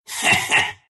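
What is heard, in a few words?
laugh, Russian; Rubick; Dota 2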